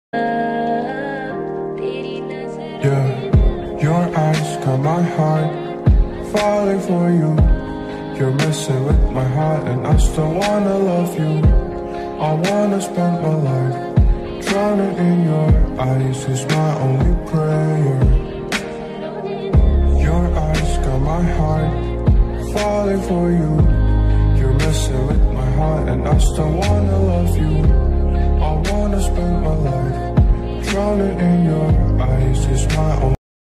slowed reverb ringtone

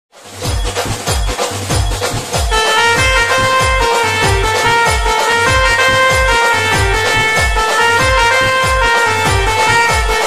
brass band ringtone; aagri koli east india koli geet

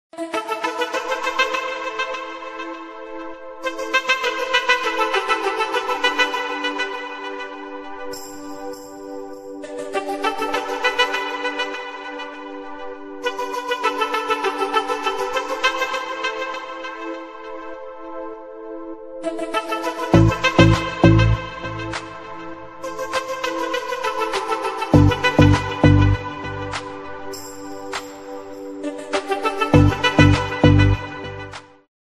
deep breath bgm ringtone; azimov

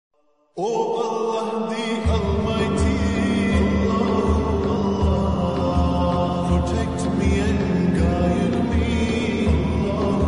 islamic ringtone naat sharif qawwali; ramzan ringtone

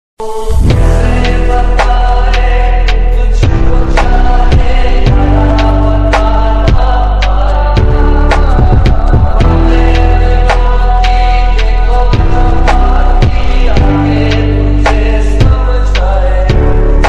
romantic love ringtone hindi

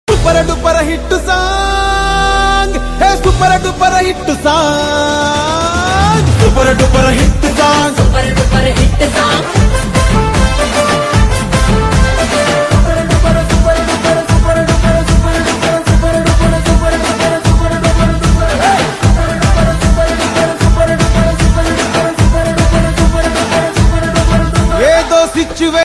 song dance; mass jathara; dance reels

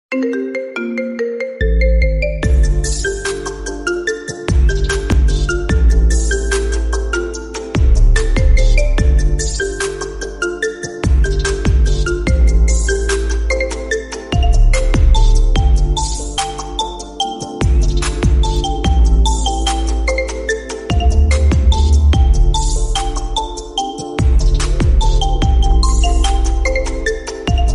remix instrumental ringtone 8d version

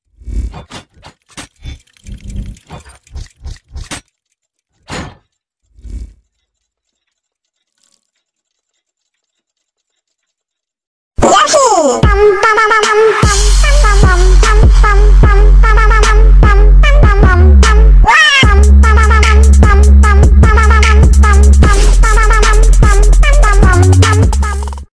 trap remix attitude ringtone; yoshi

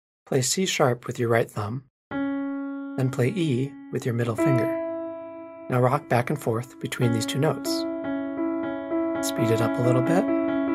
piano

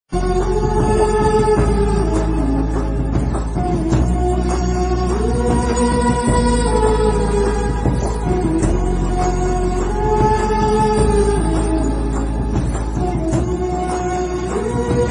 bgm ringtone; raviteja; kick movie

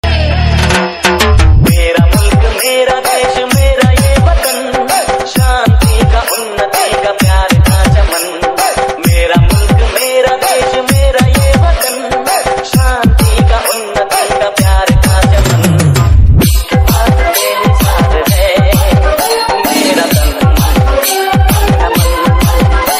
independence day bgm

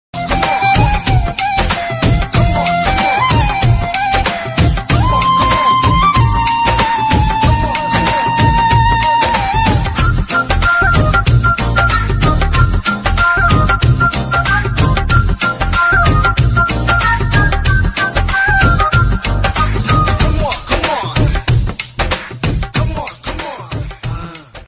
bgm ringtone telugu; gudumba shankar movie; love bgm

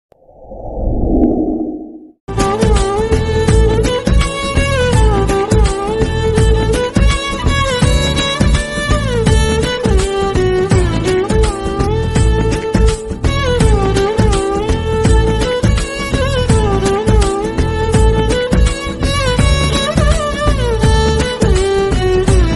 sister brother love bgm ringtone tamil

